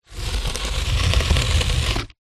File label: polystyrene expanded block scrape drag pebbled concrete ground; foley